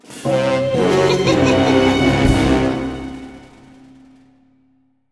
UI Victory Music Lost; gamerip; Hero Defense Haunted Island

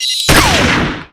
Gunner Precision Fire

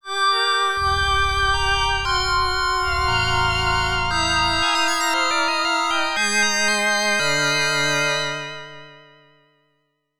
Game Clear; Strider; arcade

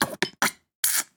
plunger suction wet splatter

